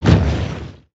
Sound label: Spinjitsu Earth Short Swing